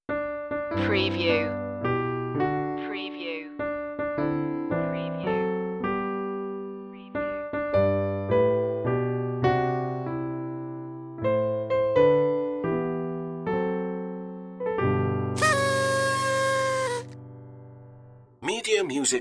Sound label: happy birthday piano easy party toot